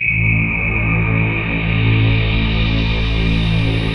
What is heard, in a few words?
Monster Stack (single note bass)